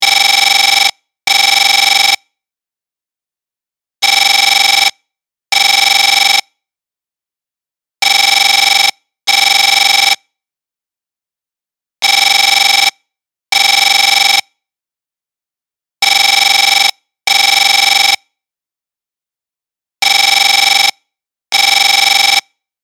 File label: alert; Xpress-rider